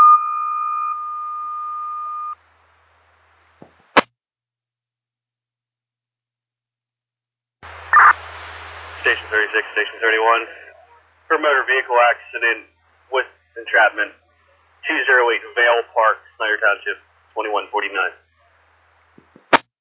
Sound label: page